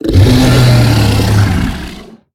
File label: creature distant call; snowstalker